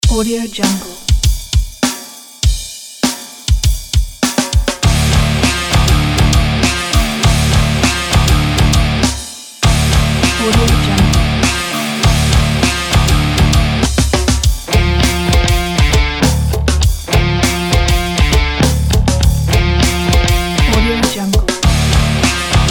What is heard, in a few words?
nu metal